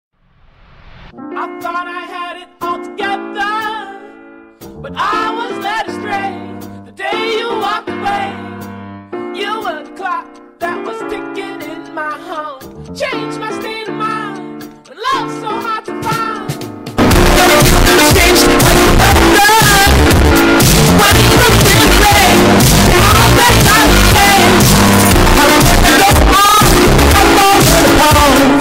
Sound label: Bass Boosted